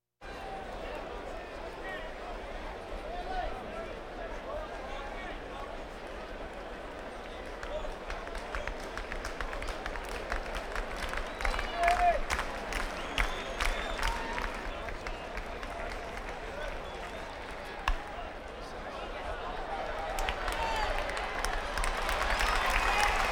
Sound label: Crowds Race cars Baseball